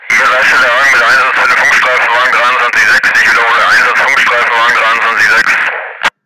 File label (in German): Polizei Alarm